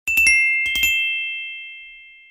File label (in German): Lumia SMS Ton